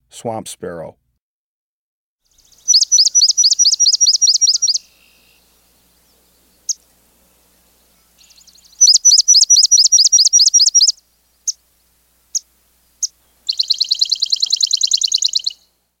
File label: Swamp Sparrow, Birds of the Chicago Region